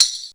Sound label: WOODSHAKER